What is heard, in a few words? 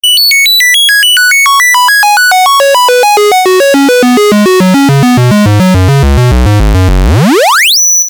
square wave